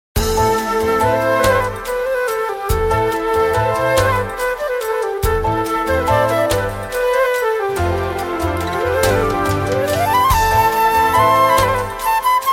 romantic flute

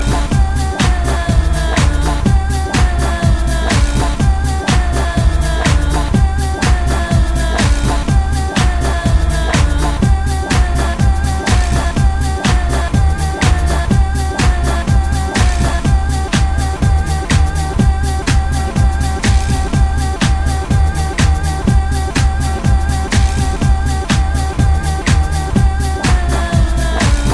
club mix

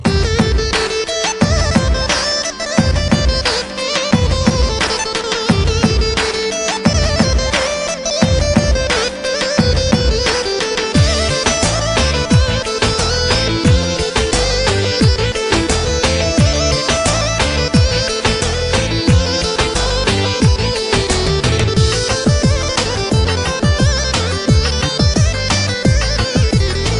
bosnian harmonica

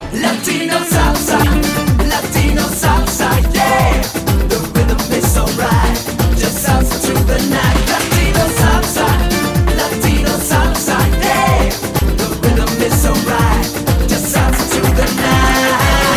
latino salsa

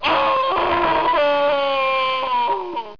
death scream; mortal kombat fatality

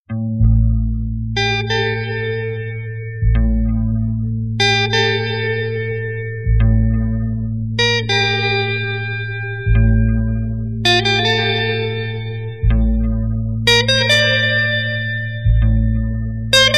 guitar

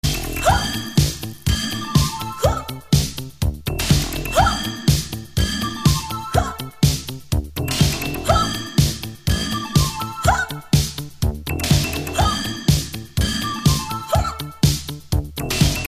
pop

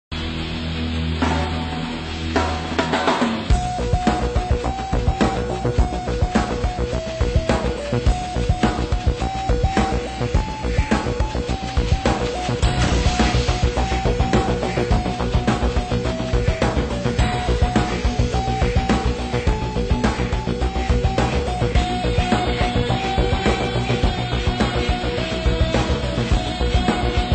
rocking guitar

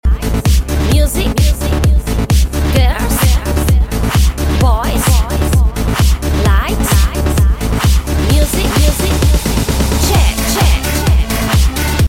disco sms

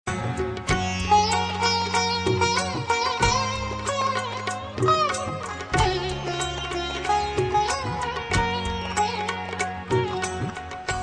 music concert

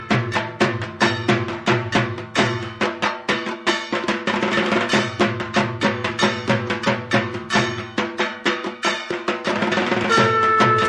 dhak durga puja

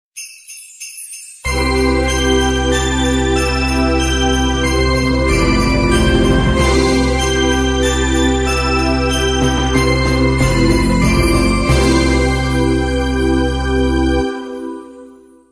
christmas bell

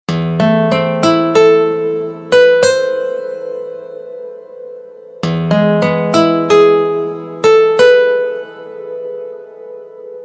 guitar music

